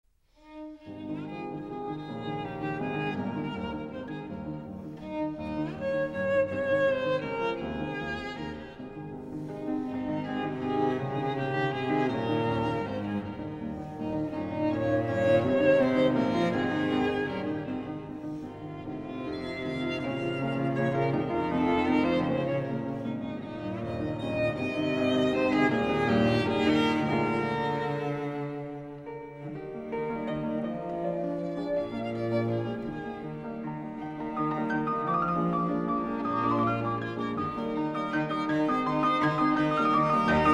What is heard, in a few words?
Trio For Piano Violin And Cello; Ludwig Van Beethoven